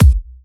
Bassdrum; Vengeance Samples; VEE Bassdrums

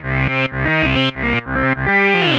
Arp C Line, ARP Odyssey; Lines; synth; musicradar-synth-samples

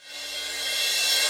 Cymbals Reverse Crash; Vengeance Samples

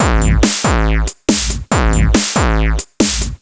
Breakbeat 140 BPM

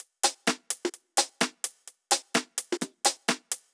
Loop, 128 BPM; VES2 Loops 128 BPM

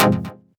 Synth Cuts; Vengeance Samples; VES1 Instruments

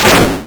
Arcade Riffs

